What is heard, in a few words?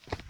Walking